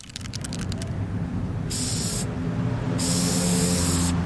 spray paint

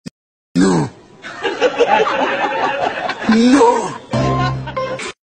noooo nnooooo

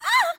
hurt; morrigan

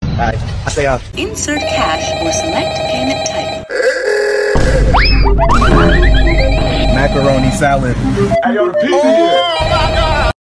insert cash

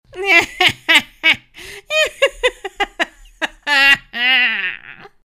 devil weiblich laugh

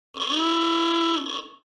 ahooga sound; ren stimpy

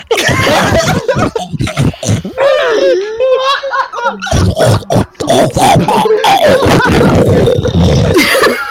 choking

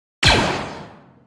battle droid blaster

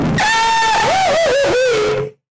goofy ahhhhhhhhh laugh

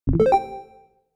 discord vc activity started

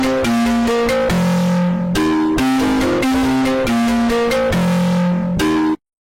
apple bass

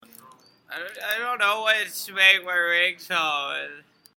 ringtone

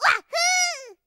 wahoo

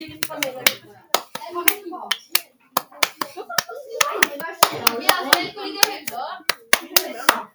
loud class

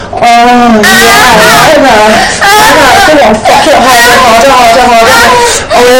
high pitch harder loud

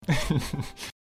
fearless laugh

